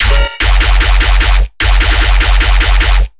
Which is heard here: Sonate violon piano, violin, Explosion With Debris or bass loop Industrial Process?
bass loop Industrial Process